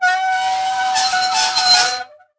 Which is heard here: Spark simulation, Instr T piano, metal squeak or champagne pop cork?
metal squeak